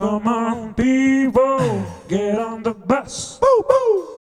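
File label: vocals, Roots Disco; USB Soundscan vol.32; AKAI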